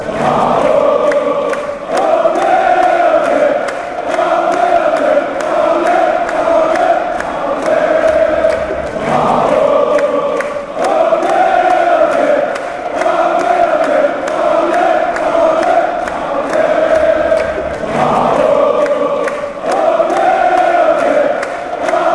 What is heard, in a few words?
ole ole